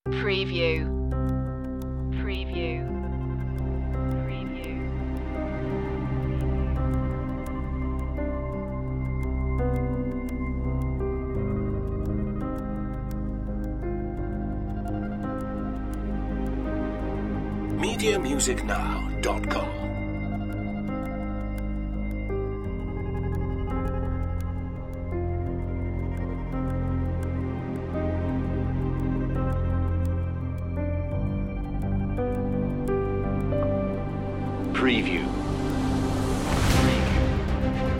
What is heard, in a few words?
last countdown no choir